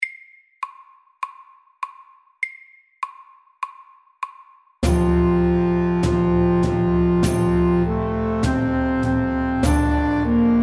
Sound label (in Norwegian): Engelsk melodi MIDDELS